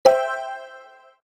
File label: rotate; gradient